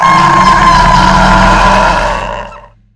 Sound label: scream buff; arkantos; medieval raid; special mutation; zombiesurvival